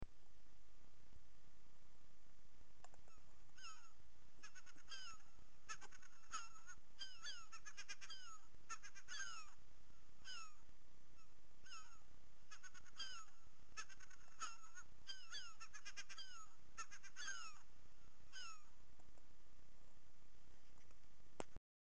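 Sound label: serebristaya chayka voice, herring gull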